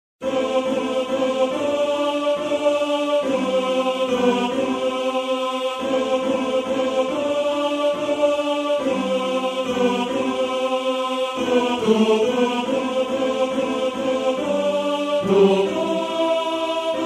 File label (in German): Tenor; Lobet den Herren; Tenor 1